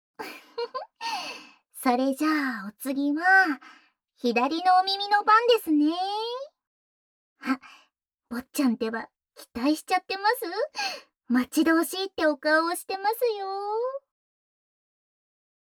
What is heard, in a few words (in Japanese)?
おまけトラック・耳舐め左耳